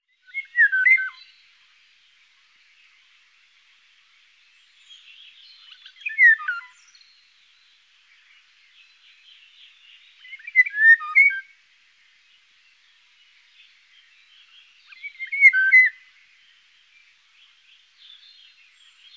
Eurasian Golden Oriole

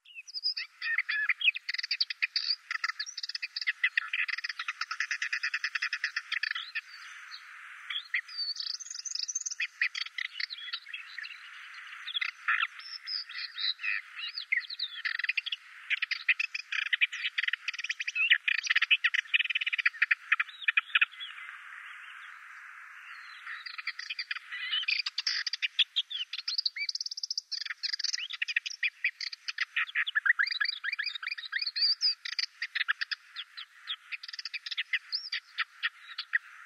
Menetries Warbler

